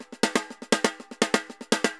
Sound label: TAMBOR, DRUMS SAMBA; Best Service ProSamples vol.14; AKAI